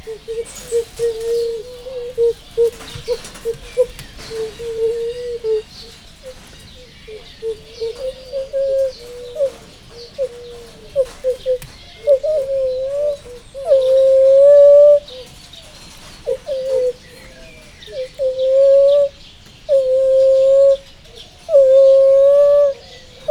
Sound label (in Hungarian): a mama énekelni tanítja a csemetéit, fehérkezű gibbon; jaszberenyi zoo 2015